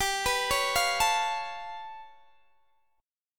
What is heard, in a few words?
G 9th Flat 5th, Guitar Open D